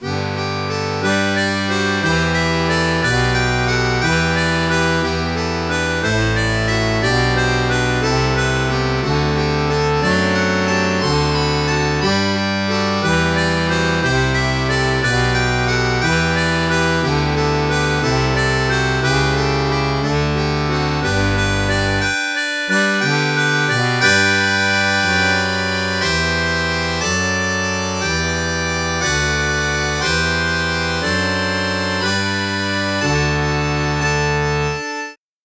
harmonica

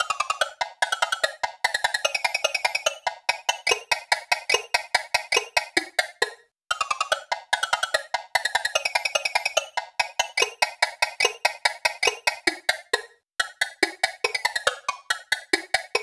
woodblock

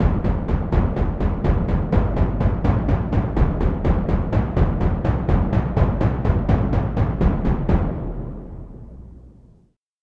timpani bass

